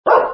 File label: Dog